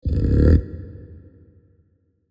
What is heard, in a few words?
kaiju adult pre chomp; biogenesis